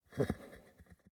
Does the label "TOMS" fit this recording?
No